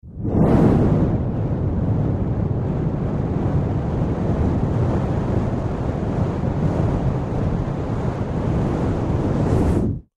Sci Fi Jet Pack, Sci Fi Jet Pack Rocket Flame Thrower On Run Off Fireball; Battle; Sci Fi Jet Pack Rocket Flame Thrower On Run Off Fireball Burst Long Science Fiction